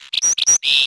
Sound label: sparrow; ambient birds; World of Tanks Blitz